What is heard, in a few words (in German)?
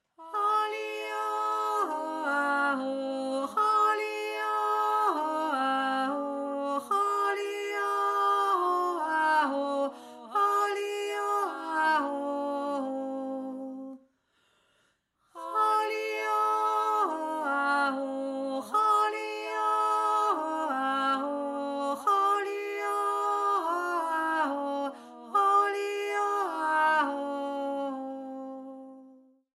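Jodler, mittlere Stimme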